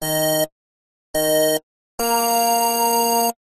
multimedia game sound retro car racing start beeps